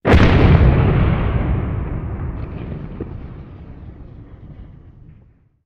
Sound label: damage hits explosions, Warships